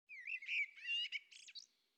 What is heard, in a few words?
blackbird; EarlyMorning; FontainebleauDemo